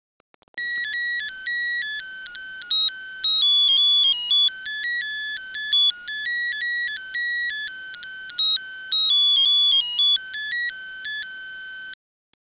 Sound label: Melody song